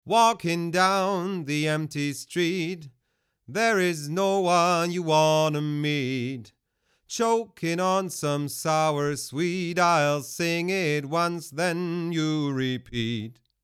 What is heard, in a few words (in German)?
IGS Audio ONE LA 500 Vocal ohne ONE LA